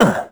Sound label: dig; Crypt of the NecroDancer